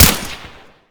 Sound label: fn2000 shot sil